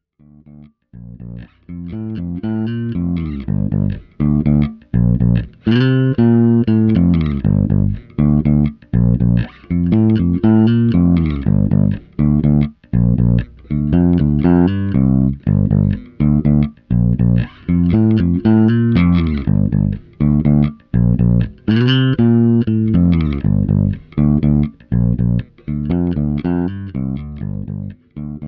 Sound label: flip top